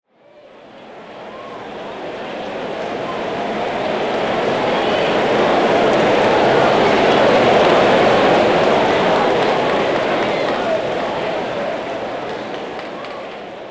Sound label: Crowd Ambience; Pitch Black Audio Pong